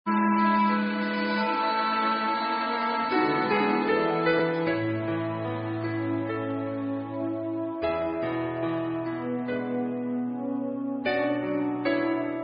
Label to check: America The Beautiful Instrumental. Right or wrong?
Right